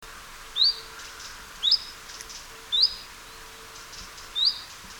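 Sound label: Phylloscopus collybita, Chiffchaff call; Vasknarva, Itä-Virumaa, Estonia 25 August 2001; By the next autumn the call had changed; Linnut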